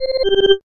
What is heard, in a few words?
alert, notifications; Android